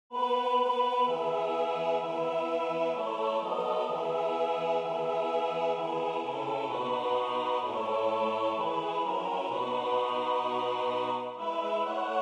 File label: tutti